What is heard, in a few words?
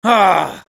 Spy negative vocalization